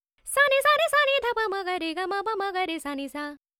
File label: Dry 4-4 D 100, Vocals Female; Sample Banks